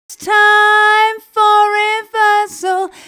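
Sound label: new vocals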